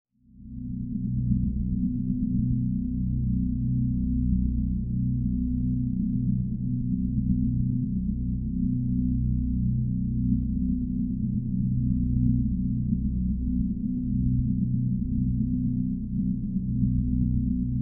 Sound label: Drone Of Suspense